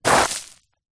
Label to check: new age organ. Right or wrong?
Wrong